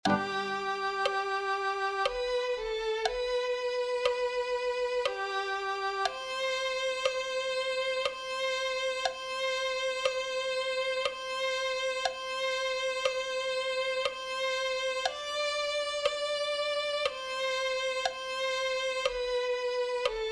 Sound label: violin